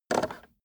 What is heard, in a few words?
car temperature knob turn short fast